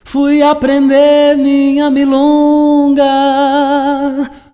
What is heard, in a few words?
vox; Voz